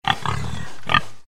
Pig Sound

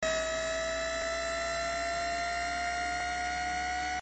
Zoom In Effect

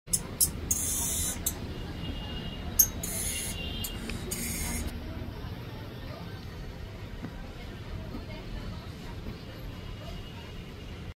iberian shrew; حشره خوار ایبریایی

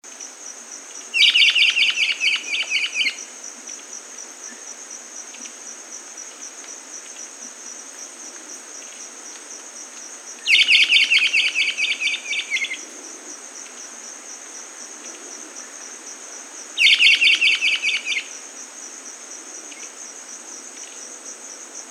Rondônia bushbird; پرنده بیشه مرغ راندونیا

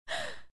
female gasp

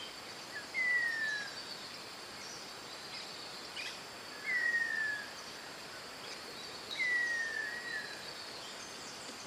Cinnamon-attila, پرنده آتیلای دارچینی

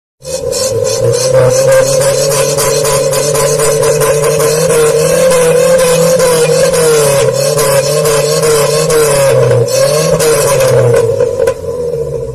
Nissan Exhaust Sound

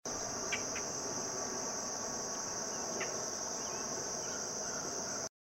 Least bittern; پرنده بوتیمار کمینه

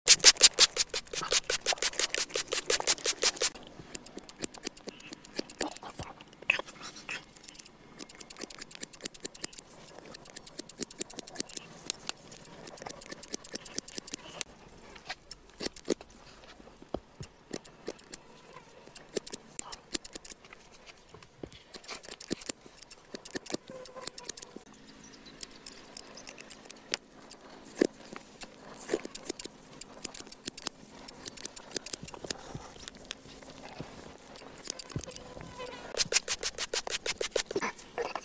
hair cut asmr; اسمر کوتاه کردن مو

